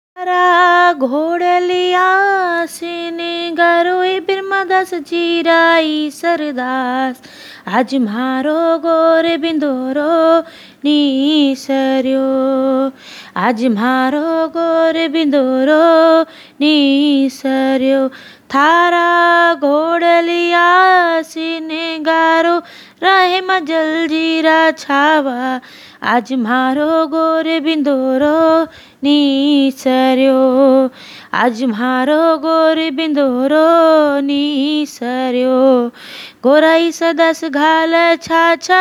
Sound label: Gorbindaaro geet, gangor geet, marwari; गोरबिन्दारो को गीत; गनगोर गीत